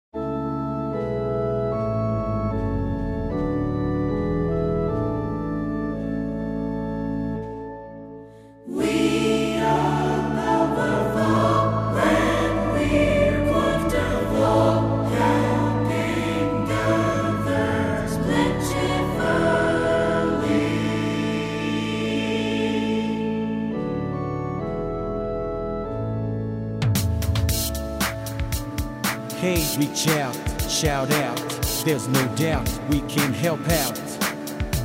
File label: Choral Organ Same Reverb Space